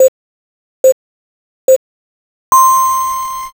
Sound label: jiho